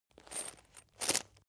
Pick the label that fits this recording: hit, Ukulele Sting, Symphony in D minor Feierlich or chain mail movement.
chain mail movement